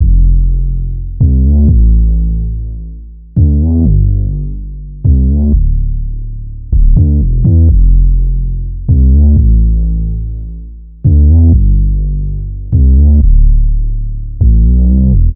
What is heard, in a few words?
Synth loop 125 Am, Synth Bass Loops; Slate Digital BASSHOLE Sample Pack